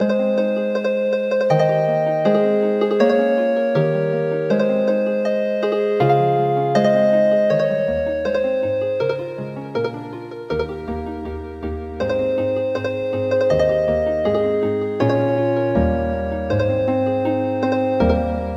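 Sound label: Healing Harp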